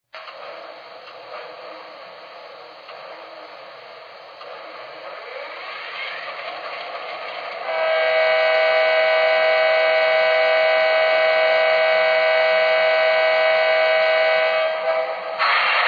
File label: gp38 2